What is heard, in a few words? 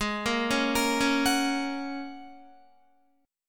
Ab 7th Suspended 2nd Suspended 4th down Guitar; arpeggiated